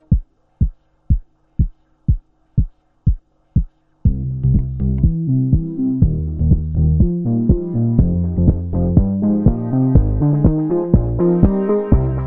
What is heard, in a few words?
Disco